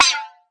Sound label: Processed Ethnic Percussion